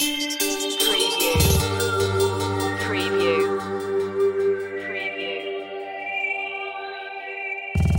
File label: xpress loop